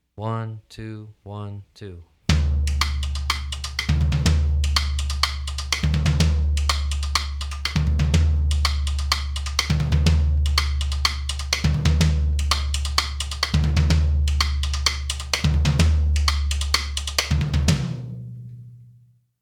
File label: merengue jaleo 2nd section